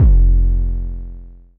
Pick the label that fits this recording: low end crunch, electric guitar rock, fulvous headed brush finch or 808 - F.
808 - F